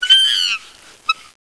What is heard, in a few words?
jungle; Renaissance